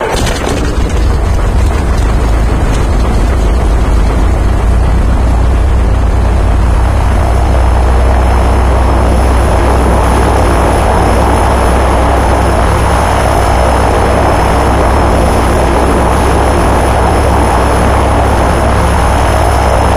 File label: c130 idle